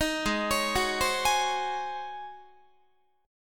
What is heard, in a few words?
G sharp 11th G sharp, down, Guitar; G#11; arpeggiated; 18,16,16,16,16,19